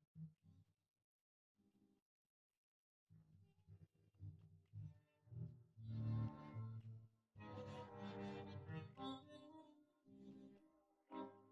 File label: guitar